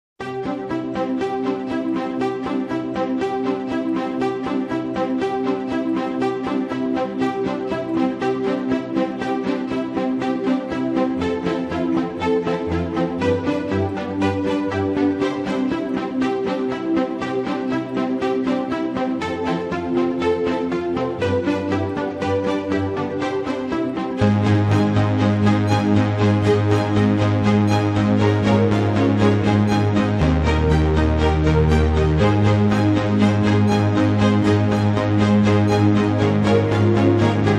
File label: Synths and Strings